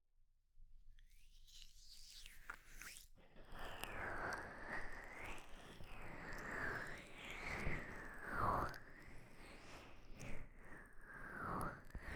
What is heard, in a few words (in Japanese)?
マッサージ音のみ 右, ASMR